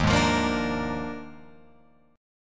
Eb Major 7th Suspended 2nd Suspended 4th down Guitar Open F; strummed; EbM7sus2sus4